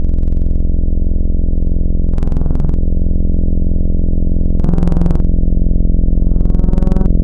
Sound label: Twinkle Saw; Synthetic's Serum Collection; Chill Trap 2 Tables